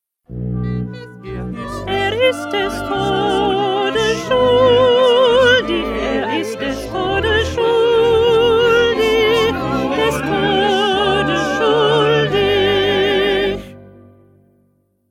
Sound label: 1st Alto; Bach-St-Matthew-Passion-42